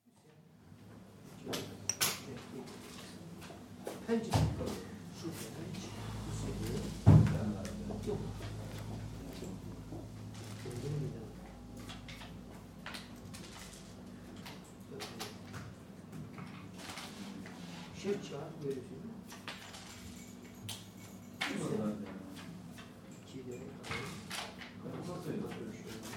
Istanbul, cafe atmosphere, with voices, cups rattled, and backgammon game played; Restaurant; Turkey; BBC